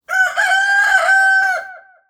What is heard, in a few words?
Rooster crow; operation-arctic